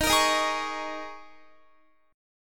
D sharp 7th Suspended 4th, Guitar; D#7sus4; strummed

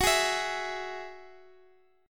F sharp 7th Suspended 2nd, down, Guitar, Open D

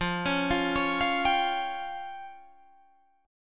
F Suspended 2nd Sharp 5th F down Guitar Drop D

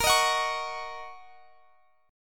F sharp 6th, chord; x,x,20,20,19,21

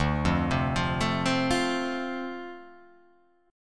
D-Minor 7th D, chord

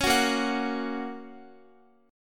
Bb Minor Sharp 5th, Guitar; strummed; 4E,4A,5D,5G,5C,6F; x,x,11,11,10,13

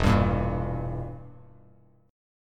E Diminished E, Bass Standard

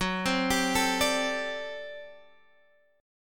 G Major 7th Suspended 2nd Suspended 4th, Gb

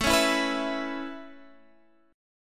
A Major Add 9th A Guitar Standard, chord; Aadd9 strummed; JGuitar

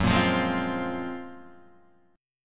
F sharp Major Sharp 11th, Guitar, Drop D; chordshape; down

